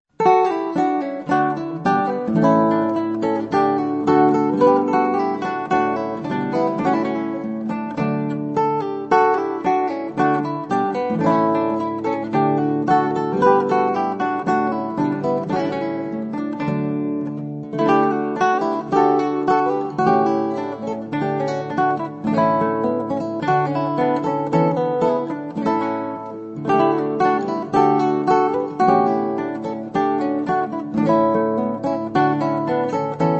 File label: Two Guitar Duo